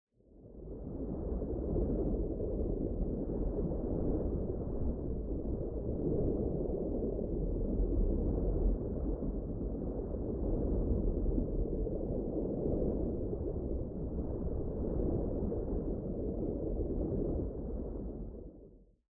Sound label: rumble